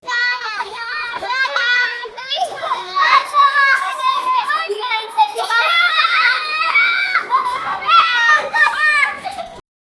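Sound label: children laughing and giggling, playground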